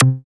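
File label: PER PROCU, Procussion, percussion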